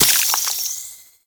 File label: Baby Resource Pick Up; Warframe; Nokko; Reroot